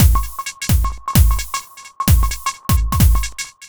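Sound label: No snare, Drum Loops 130bpm; Zero-G; Soundclash Nu Dance Electro; Acidized Wavs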